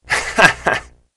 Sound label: cheer